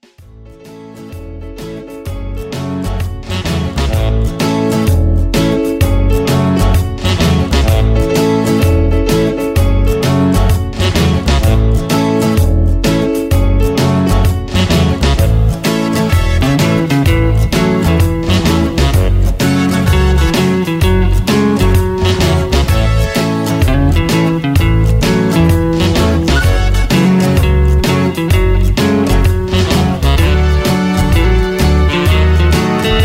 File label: Instrumental